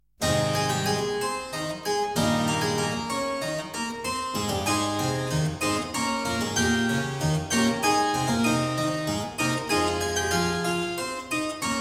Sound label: Prelude in A-flat major, clavier; Prelude & Fugue No.17; BWV 862; BACH; WELL TEMPERED CLAVIER; BOOK I